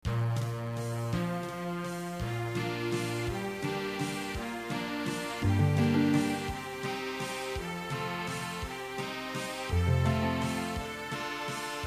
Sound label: waltz